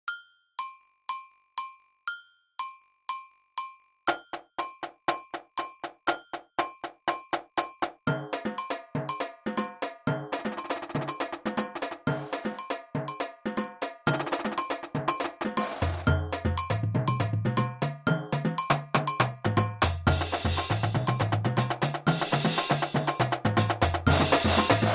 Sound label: 120bpm, drumline